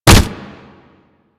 Generic Hit

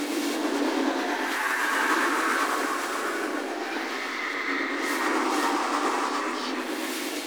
Void Strike Loop; Warframe; Focus; Madurai

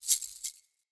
Banglenut Rattle Medium D

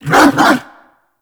Kubrow Tame Loot Success B; Warframe

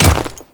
Ice Step; Warframe; Grineer; Royal Guard; Footsteps

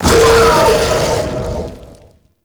Ghoul Spawn; Grineer

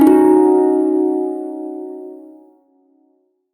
yo chord, shawzin; Warframe

